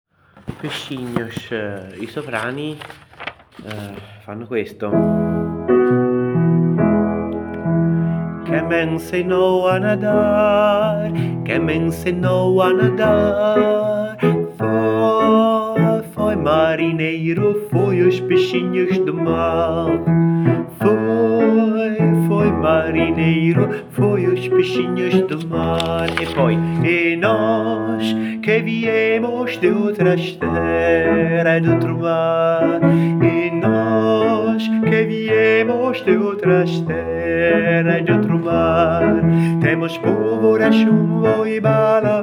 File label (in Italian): SOPRANI; Peixinhos